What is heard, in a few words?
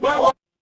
feedback level up